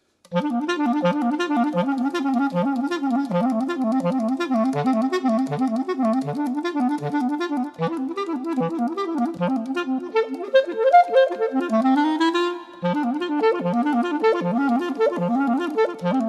solo jazz clarinet; 3 pieces for solo jazz clarinet

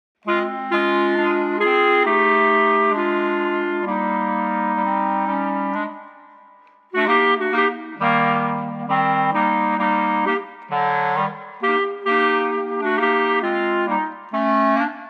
Clarinet Trio

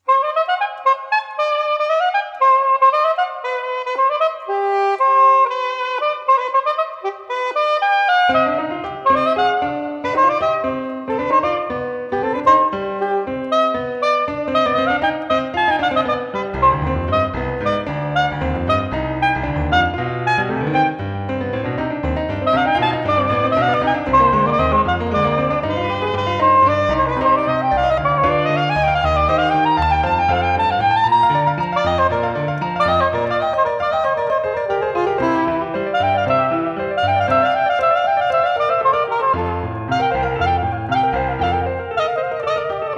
Fugue in C Minor Soprano Sax

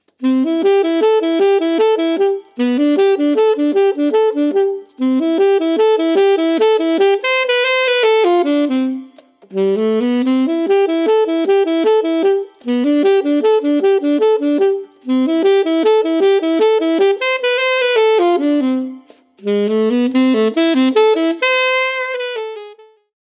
alto sax

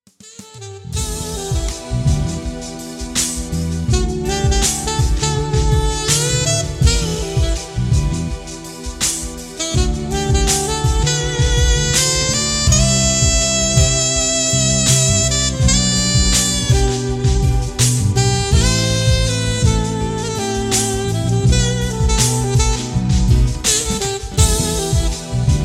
Alto

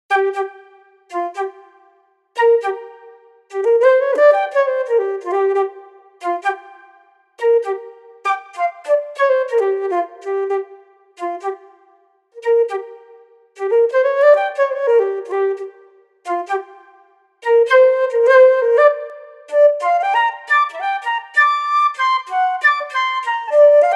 flute

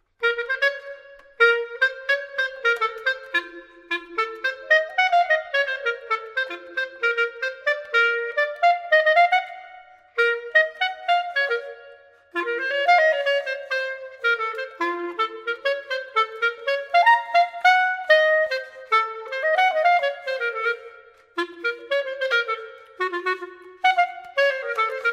Clarinet; 52 MELODIC ETUDES-CLARINET Bk 2

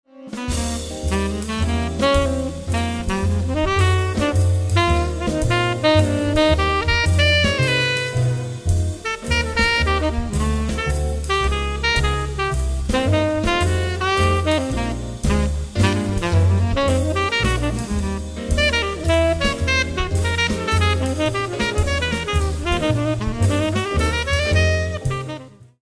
tenor

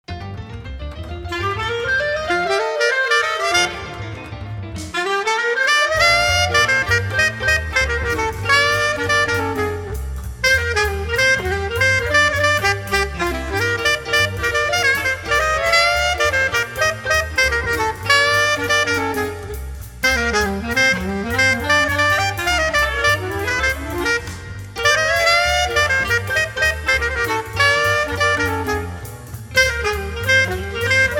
Alto

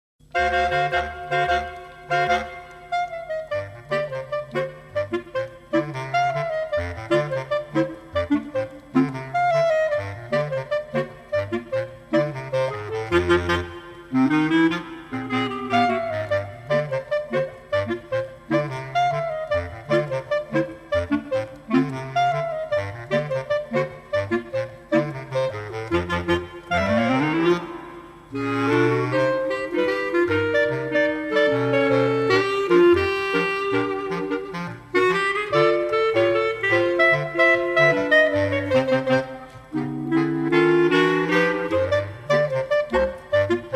CLARINET QUARTET; Mambo style

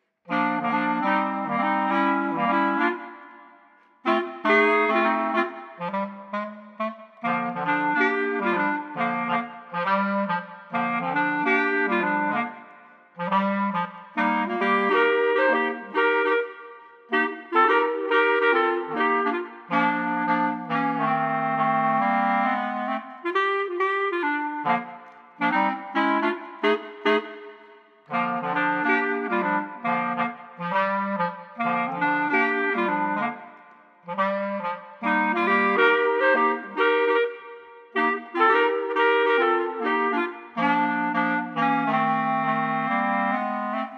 Clarinet Trio

